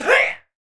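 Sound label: Shadow Master damage; Seer; GamigoNA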